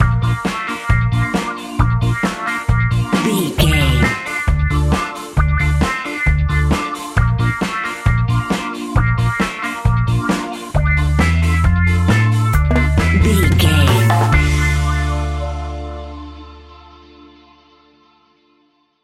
reggae ska fusion music cue, Classic reggae music with that skank bounce reggae feeling.; Great for summer